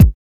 2000s rich mid range kick a sharp key, Royality free kick drum single shot tuned to the A# note; kick drum samples